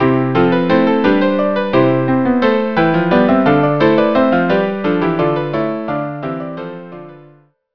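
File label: string quartet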